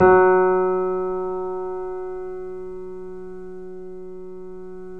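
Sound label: F#1, Bösendorfer, 3D Pianos; E-MU Producer Series Vol. 5 – 3-D Audio Collection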